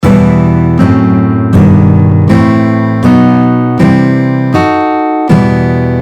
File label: Em harmonic minor chord scale, guitar